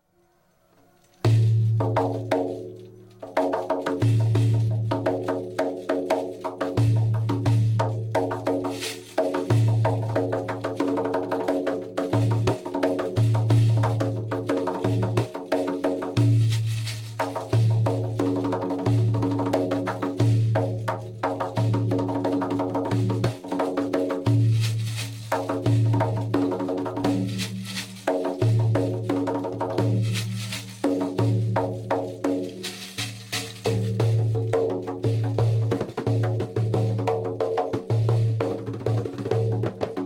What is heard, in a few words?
music Daira; SilkRoad